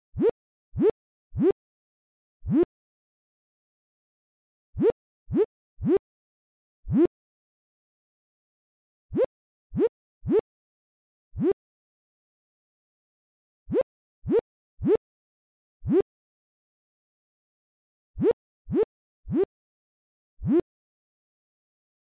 Sine Wave

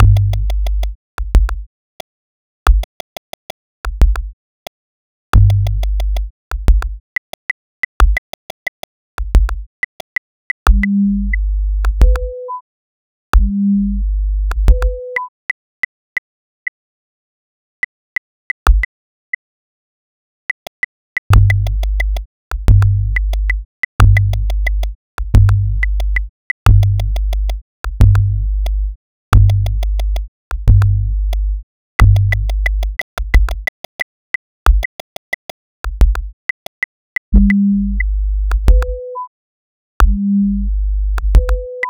sinwave

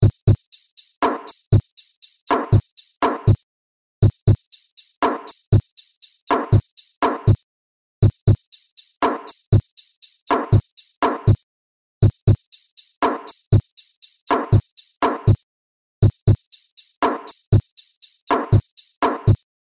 Sine

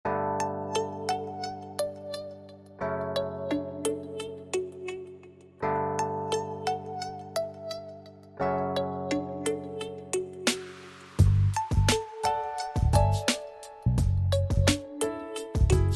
hip-hop BEAT

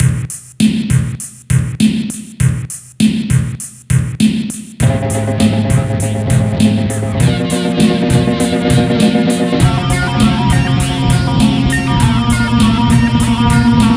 sinewave

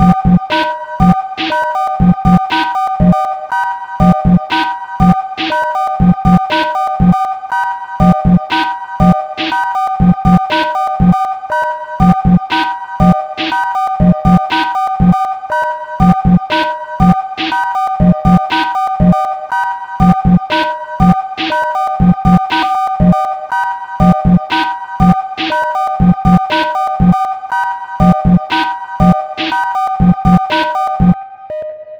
Sinewave Project

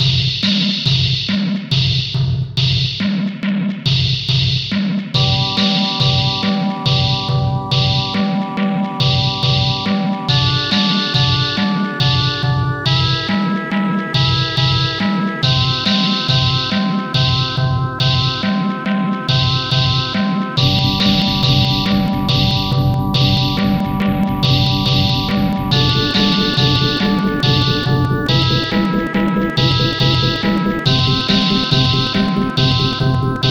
sinewave

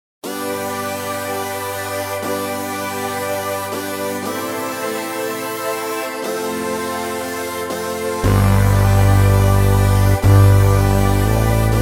Midi

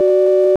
rhythm sine

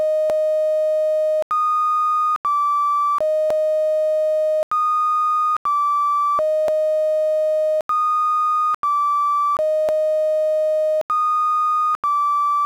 sinewave

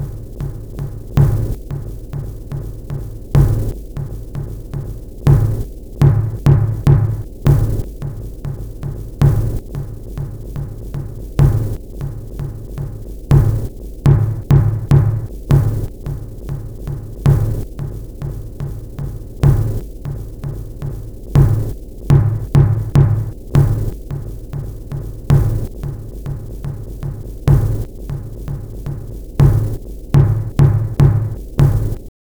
Sine Wave

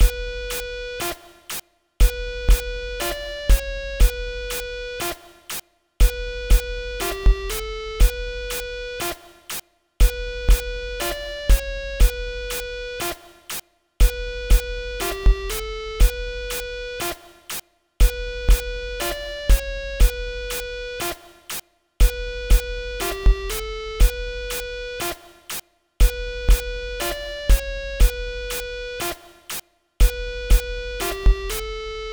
Sinewave

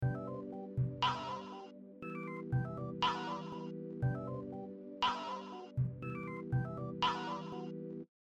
SINEWAVE

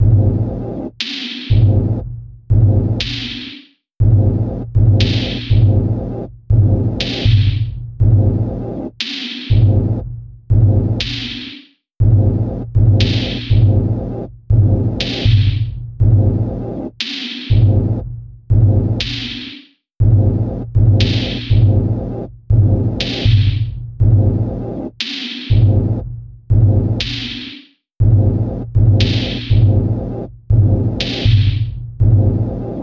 Sine Wave

